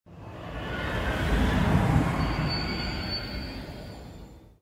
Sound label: snap; infinity-craft